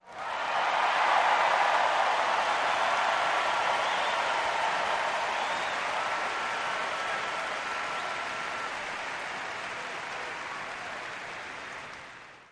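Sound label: Crowd 50 ooo W Whistles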